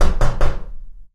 container linear knock; Source Engine; Portal 2

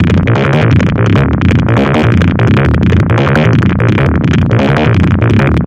music; Left 4 Dead 2; Source Engine; video games; zombat

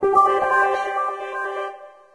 startup; sound schemes; inside your computer; windows 9x

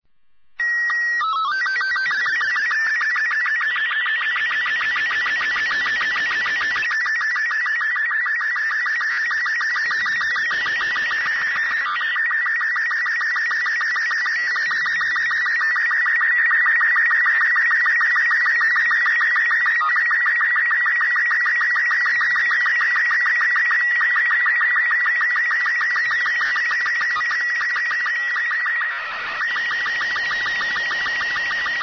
ambient; Portal 2; Source Engine; video games

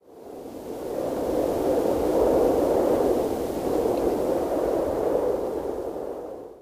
tree wind, forest; ambient; Left 4 Dead 2; Source Engine

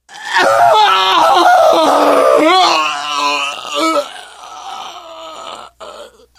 death scream, biker, survivor voice; source engine; left 4 dead 2

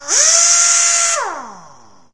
pneumatic drill; ambient; machines; Source Engine; cstrike

